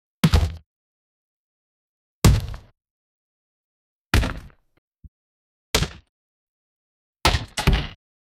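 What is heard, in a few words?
basketball fall on the ground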